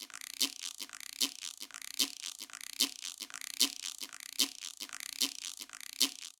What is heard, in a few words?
Son Montuno Guiro 150bpm; Latin Percussion; Ableton; Factory Packs